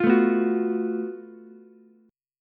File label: F sharp Minor Major 7th Double Flat 5th, Ukulele; strummed